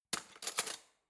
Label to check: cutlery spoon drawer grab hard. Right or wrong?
Right